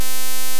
DC offset Wave C4

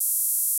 Gauss Filt C4

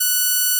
Gauss Filt F#6

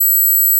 Gauss Filt B7

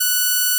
Odd Increase F#6

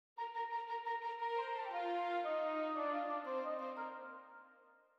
ORCH REDUC piano; Beethoven; Symph3; OutofContext

